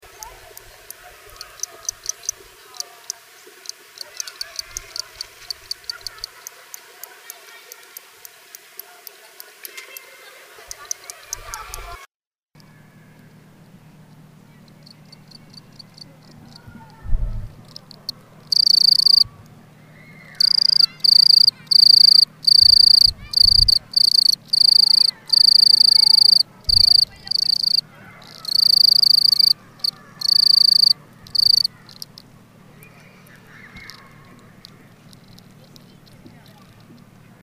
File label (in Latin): Gryllus bimaculatus; BIOAC ORTH